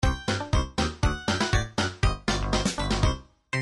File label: game over; Gameboy Wario Blast